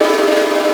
G#4 TYM ROL; Northstar - The Wizard VOL-1; TWEEDLE DUMS

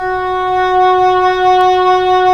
Cor Oboe; Roland - LA Composer vol. 4

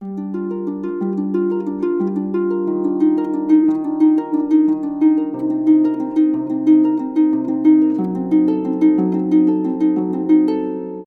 90 G7-Eb7-C7-F#7b, Orchestral Harp; PowerFX; Downtown Orchestra

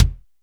BD, SR16 drums; 90 s Sample CDs; Best Service

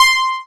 SYN, Microwave; Sound & Vision - Gigapack I CD 2

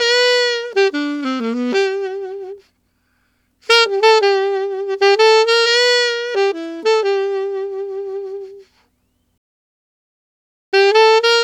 Alto Country Waltz in G, Sax; Sonic Foundry (Sony Creative Software)

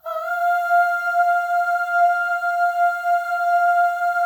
AHS, Symphony of Voices, FEM; Spectrasonics; FEM.2 AHS

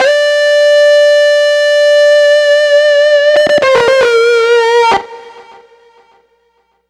Grunge Lead; Dr. Fink's Funk Factory

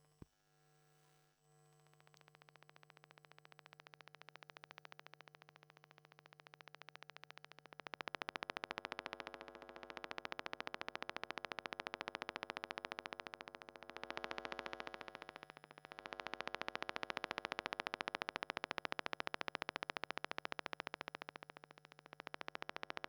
Electromagnetic Field, Electro; electric fields

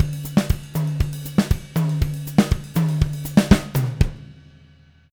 120 CARIBE; Sampleheads; Peter Erskine Living Drums

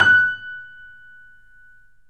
F#, Boesendorfer, 3D Pianos

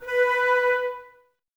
Flute, Woodwind; Miroslav Vitous - Woodwind Brass Ensemble; 90's Sample CDs

